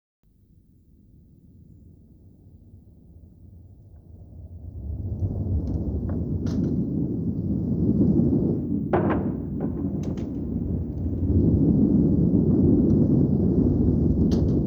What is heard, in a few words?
Backing track